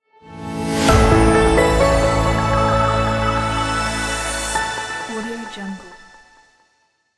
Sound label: Inspiring Music Logo